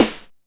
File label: U BAHN, SNARES; Back In Time Records; Big Bang